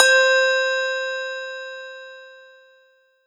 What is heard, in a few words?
Ensoniq ZR-76 Handbell C5